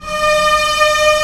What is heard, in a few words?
STR A2 PP, Orchestra; Sound On Sound; Peter Siedleczek's Orchestra